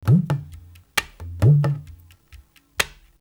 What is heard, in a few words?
Percussion; Coldcut's Kleptomania VOL1